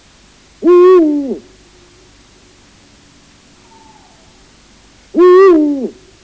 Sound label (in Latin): Bubo bubo